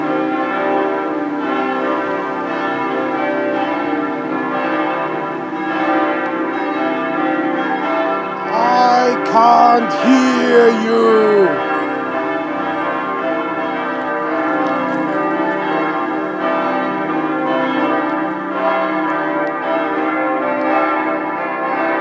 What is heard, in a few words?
ding dong